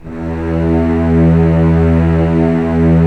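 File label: string section; Roland - LA Composer vol. 1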